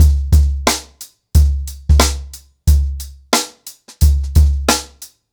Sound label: Ripple Effect 90BPM, acoustic drums